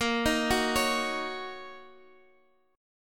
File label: F sharp 6th, chord; x,x,8,8,7,9